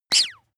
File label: bath duck release hard